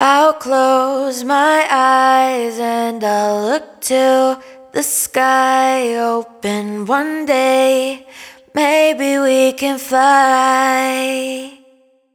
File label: hook We Can Fly 140 Ab wet, Vocal; Slate Digital Omnivox Vocal Sample Pack